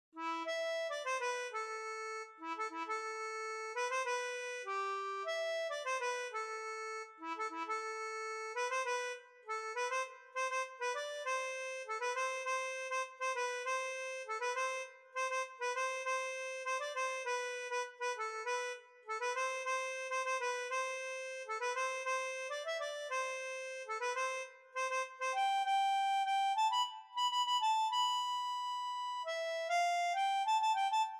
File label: Harmonica